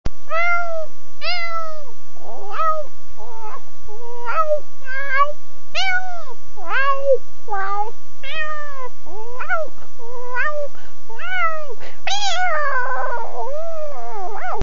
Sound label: cats